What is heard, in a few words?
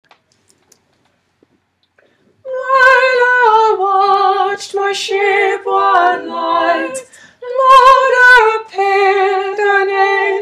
echo carol